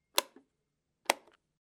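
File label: switch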